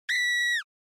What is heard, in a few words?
Horror Scream Low Dry